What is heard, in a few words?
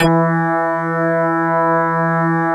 METAL BR